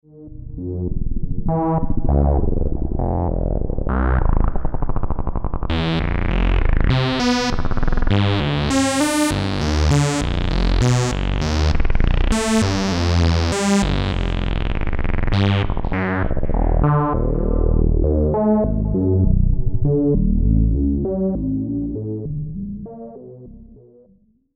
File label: s-h VCO